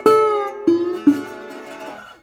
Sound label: Blues Guitar Samples; ILIO - Fretworks